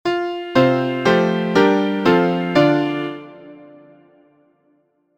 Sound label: predicted chorale; minibach